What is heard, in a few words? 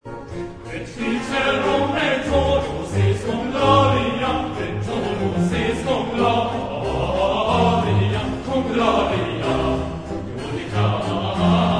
h moll tutti